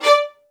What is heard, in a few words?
D5, LOUD VIOLINS; Q Up Arts - Denny Jaeger Master Violin Library